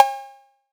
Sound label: perc